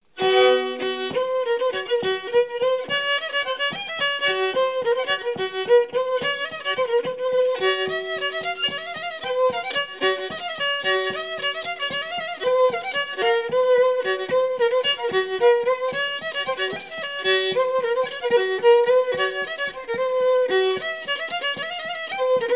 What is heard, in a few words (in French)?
Bourrée; Bourrée De St Clément